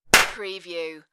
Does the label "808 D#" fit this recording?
No